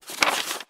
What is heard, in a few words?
page turn sound; Ditex Manager